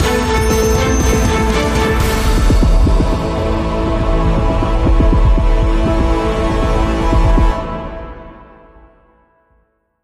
Orchestrations of Death Stinger

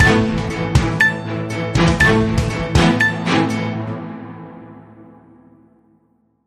Modern Sound of News Stinger

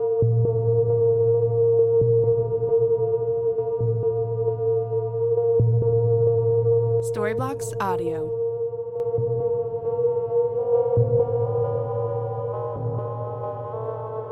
Ambient House Ambience 134BPM F

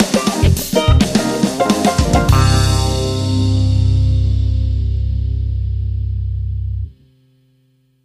Funk Stinger

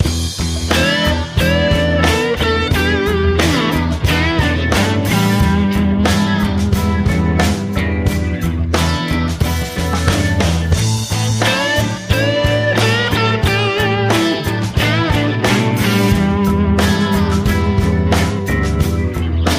gator blues

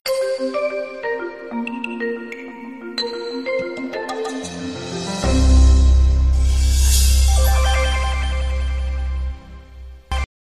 logo

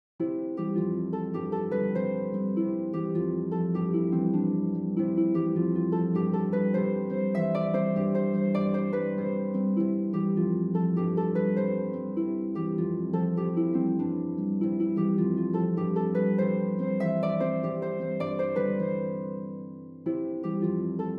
harp; humpty dumpty

